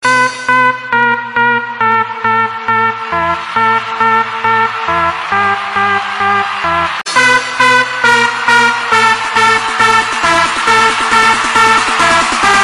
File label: trance music